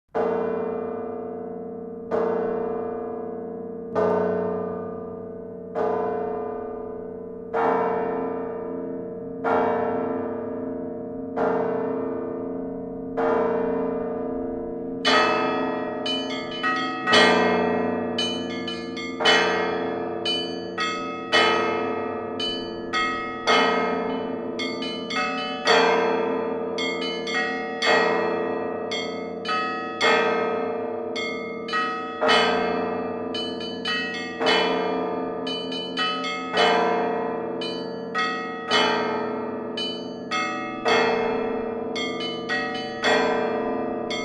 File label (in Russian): Egorevskij zvon, kolokola